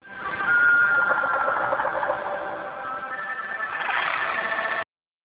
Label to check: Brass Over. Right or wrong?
Wrong